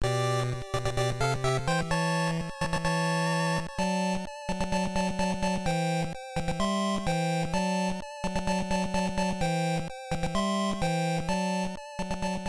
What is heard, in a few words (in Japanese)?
レベルアップ; Medarot